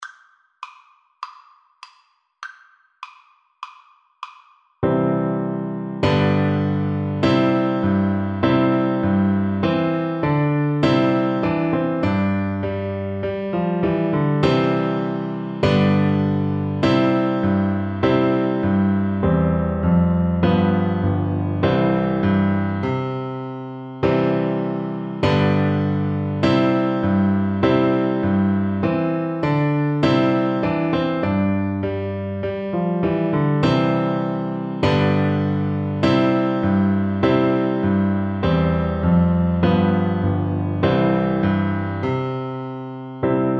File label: trumpet voluntary, Recorder